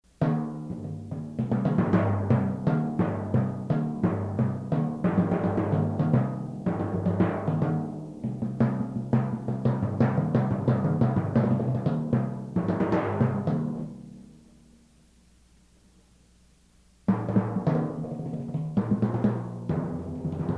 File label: timpani